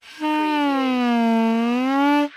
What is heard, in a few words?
Straw Blow Long